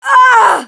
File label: death; mohaa; dialogue